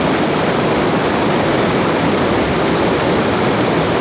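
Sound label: waterfall very large loop; water splashes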